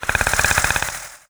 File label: warning, antlion; creatures; vox